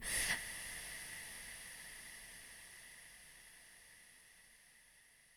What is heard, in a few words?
breath